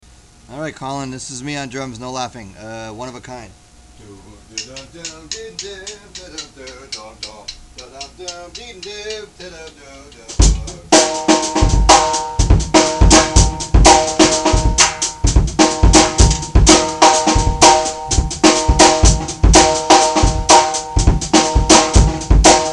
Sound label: funk groove drums; electric band